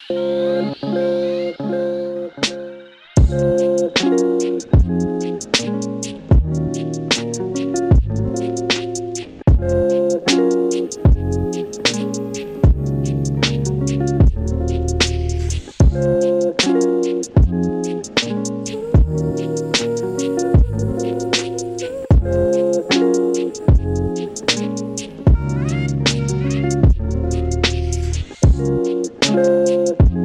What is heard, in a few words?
Ice Lofi; موسیقی بی کلام لو فای برای کار کردن; lofi at work